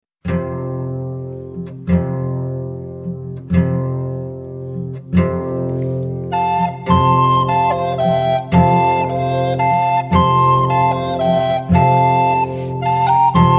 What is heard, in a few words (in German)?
landler